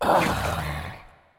witch death; Rescue Team3

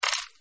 customer wants to pay; Delicious Emilys Childhood Memories

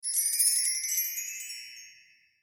start game; New Yankee in King Arthurs Court

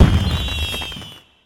salute; Rescue Team3

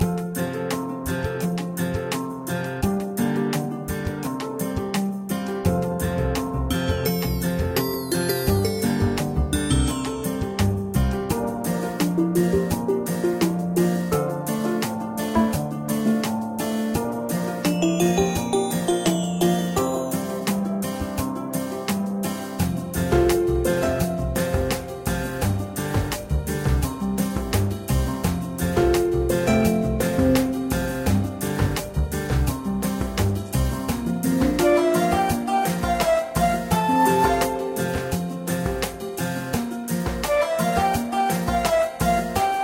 music; Rescue Team 3

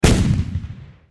shot; New Yankee in King Arthurs Court

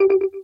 for sale unlist; Buildalot

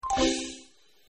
dialog hide; Delicious Emilys Childhood Memories

